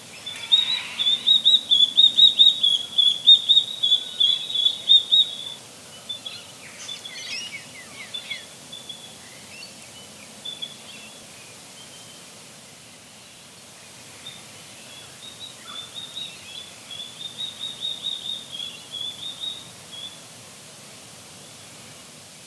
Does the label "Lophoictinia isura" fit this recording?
Yes